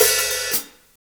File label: hh kick hit, HAT, Drum Kits; Big Fish Audio - DOM; Redrum